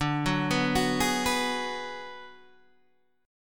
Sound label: D 6th Flat 5th, Guitar DADGAD